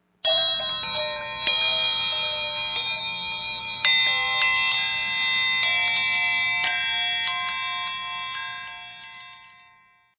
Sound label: Garden Bells Large